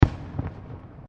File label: Fireworks Single Explosion; Fireworks (Sound Effects:Backgrounds)